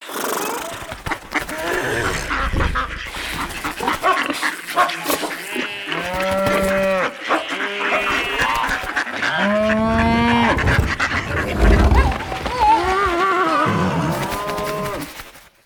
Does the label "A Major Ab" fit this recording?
No